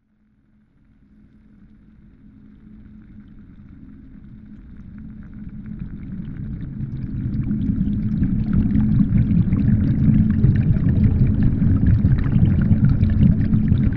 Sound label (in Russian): dvizhenie mimo; Звуки подводной лодки